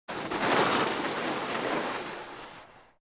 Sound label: Skiing, travel and stop, snow